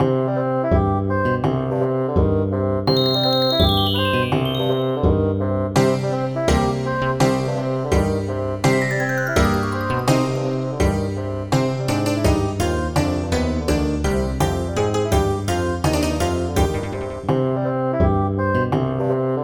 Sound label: sirens